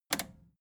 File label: record player repeat click fast